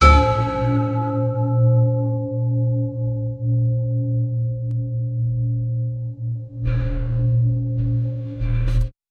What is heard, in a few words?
several deep bell strikes